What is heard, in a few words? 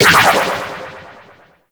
Turret Proj; Vor; Grineer